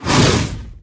Elem Impact Fire; Defenses; Dungeon Defender; DunDef

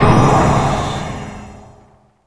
Weapon Upgrade Complete; DunDef

